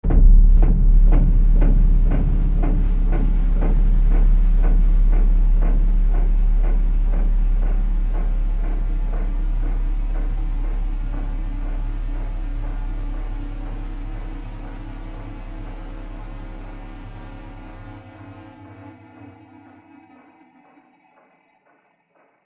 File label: Deep Stab With Resonant Echos; Digital; Impacts; Crafted Signals